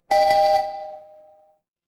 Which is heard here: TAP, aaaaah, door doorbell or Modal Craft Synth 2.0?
door doorbell